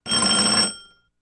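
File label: Doorbell Short Ring; Shrt Ring A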